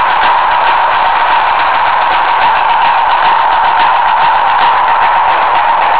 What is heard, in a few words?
caledonia drummers; calgary weekend